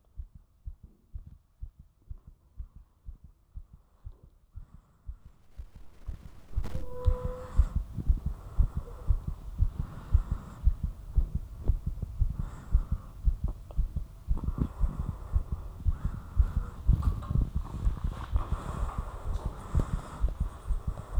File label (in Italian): battito